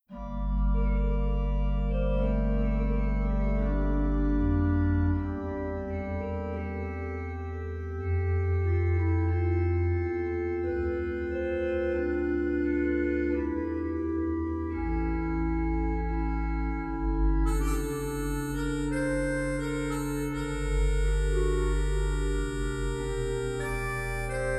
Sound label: Sesquialtera barpfeife, organ; Organteq